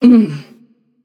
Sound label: yay passion; chaac